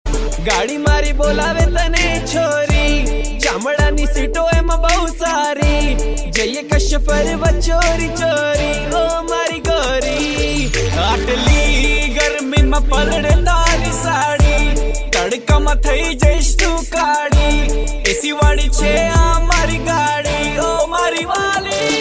Gujrati

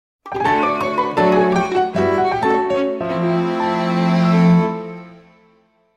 Cafe Music

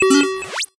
8BIT

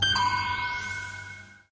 Radar sonar; Btooom

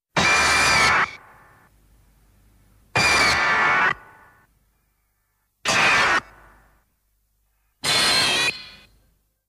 Roar; Gigan

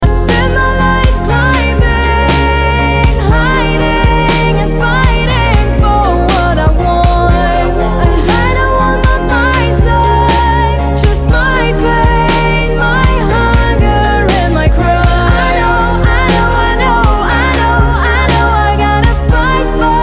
soprano

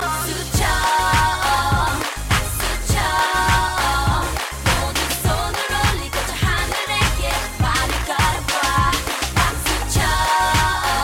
Clap Your Hands

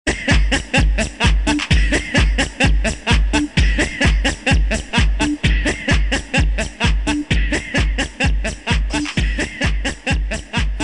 Chacha Mix

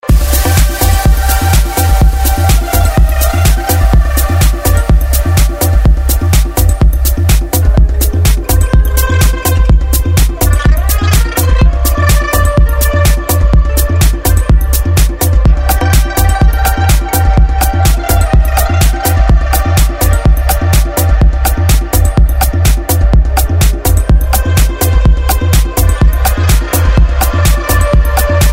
Progressive House

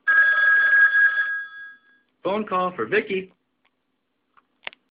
Phone Call

